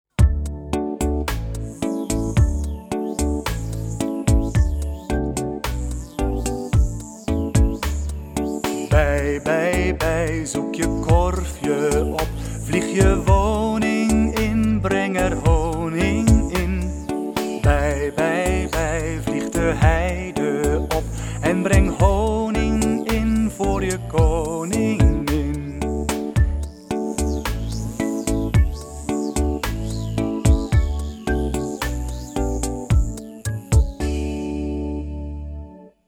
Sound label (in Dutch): bij bij bij met zang